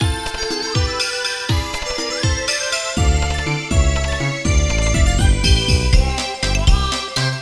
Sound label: Mic; Sexy Parodius; Saturn